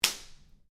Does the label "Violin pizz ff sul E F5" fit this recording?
No